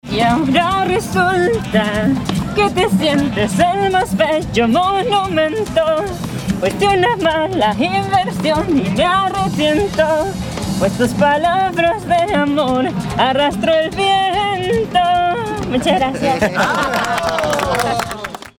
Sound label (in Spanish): cantando cancion